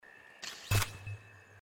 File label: pickup health; hyper light drifter